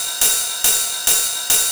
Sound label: Loop 140 BPM; Vengeance Samples